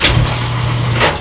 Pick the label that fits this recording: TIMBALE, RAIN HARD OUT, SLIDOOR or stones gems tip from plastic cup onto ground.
SLIDOOR